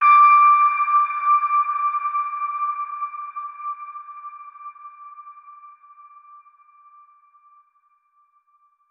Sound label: Ping Piercing